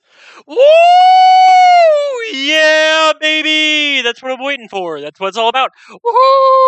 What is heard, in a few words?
WOOOO